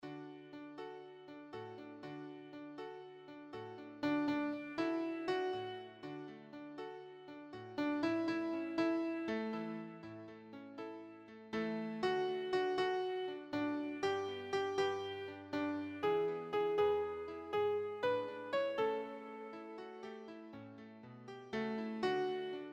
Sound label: Beach Song Key of D melody